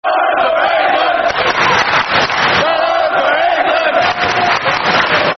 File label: Vernon For England, Football Chants (Soccer Songs); Bolton Wanderers Bwfc